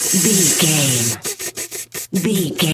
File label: snake hiss